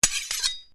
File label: Blade Swish Low, Sword